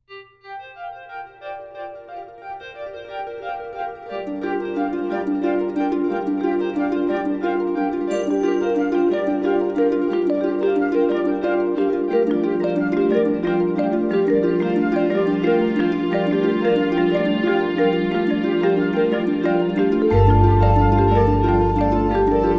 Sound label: Orchestral, Music